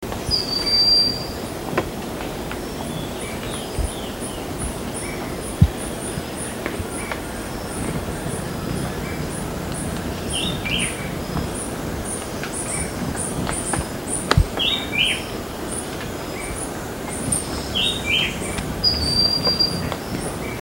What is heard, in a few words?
broad-winged hawk